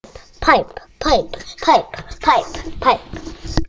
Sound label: pipe